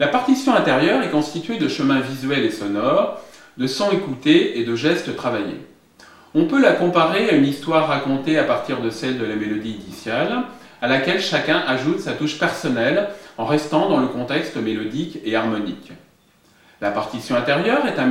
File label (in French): violon jazz